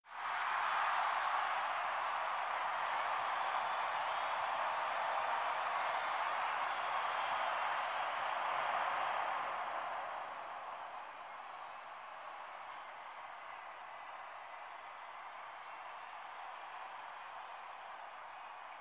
Arena Crowd Ch Whoos, sports arena

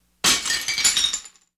Dish Falls Breaks Sc; dishes

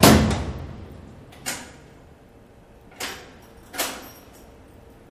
Electric Prison Door; jail & prison doors

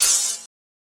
Metal Shing Rvrs Scr, small impacts; Metal Shing Reverse Scrape

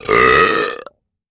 Loud Shrt Nasty Bel, belching

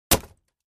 Axe Paneling 1 Side, axe hits

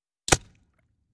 Bullet Impacts Water

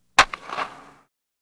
Baseball Bat Wood Hi; baseball fx & games

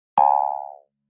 Quack Digital Spring, quack

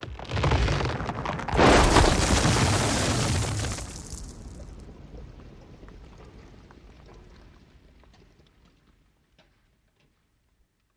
Cemetery Gravestone, avalanche

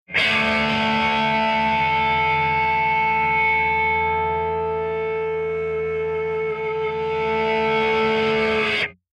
Elect Guitar Feedbac, guitars

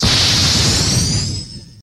Underwater Laser Fx

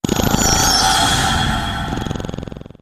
Digital Flutter Stret, stinger

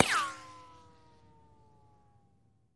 Bullet Ricochet Sin

